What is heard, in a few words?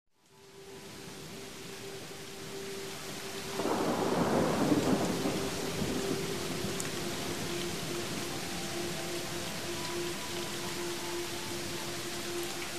Rain Thunder Relaxation Music